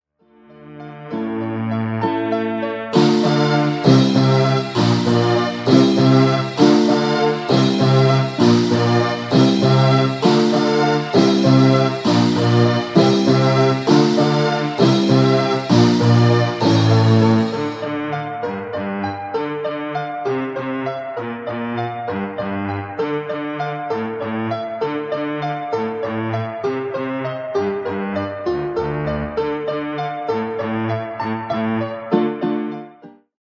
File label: Strings Strings; String Waltz